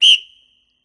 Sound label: Sfx Basketball